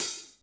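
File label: DRY KITS, dry overhead; Crypton; DrumBase5000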